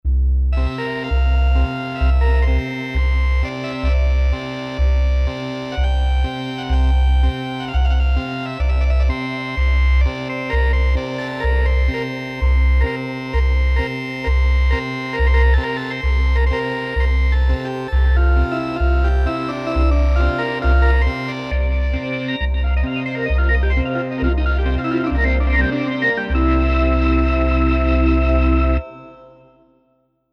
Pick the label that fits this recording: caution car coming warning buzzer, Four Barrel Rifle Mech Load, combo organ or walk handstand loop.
combo organ